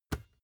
basketball catch soft

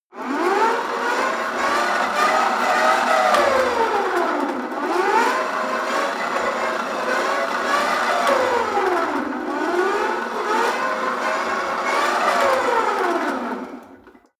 rope trainer pull sequence fast; sport freizeit; fitnessstudio

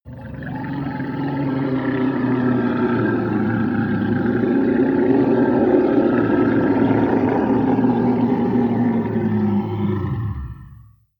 troll scream long; kreaturen

